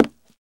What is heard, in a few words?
hardwood step; Golden Crayon Sounds